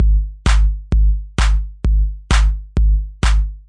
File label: 130 bpm straight up kick and clap